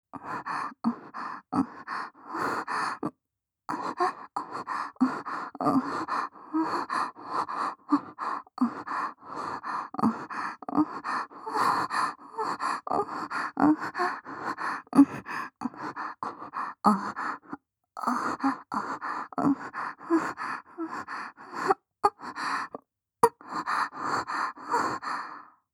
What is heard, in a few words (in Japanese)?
オホ声 バック 甘め